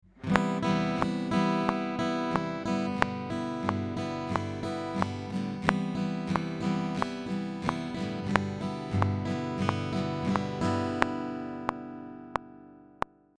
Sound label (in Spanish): añadir más rasgueo a la guitarra